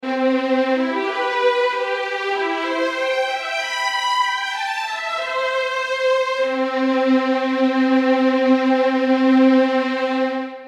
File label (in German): Legato Violinen